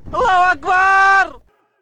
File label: detonate; insurgency; allahuakbar